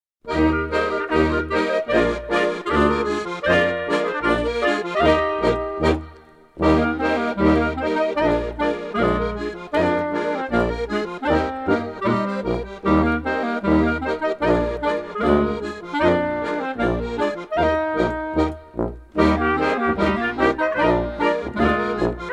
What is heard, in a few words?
Muenchner polka